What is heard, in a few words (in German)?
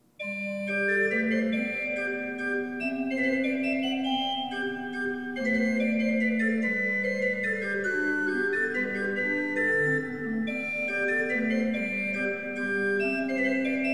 Menuett in G-Dur; biggs bach book